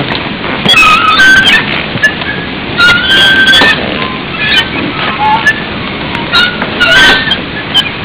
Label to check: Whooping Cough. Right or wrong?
Wrong